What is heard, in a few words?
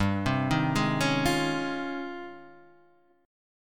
G sharp Major 13th